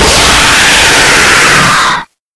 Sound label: Jumpscare Sound; TRTF2; Remake